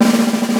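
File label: snare march roll, Yamaha Motif ES drum machine; 300 Drum Machines; Yamaha Motif ES-Series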